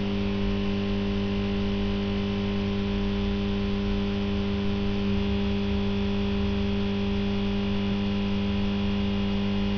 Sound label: buzz light; svencoop; fnaf